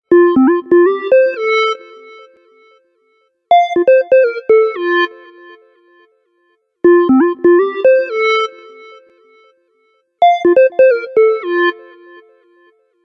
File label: Ring Silk; Microsoft Windows-Mobile-6.5